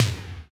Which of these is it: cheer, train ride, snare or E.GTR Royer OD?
snare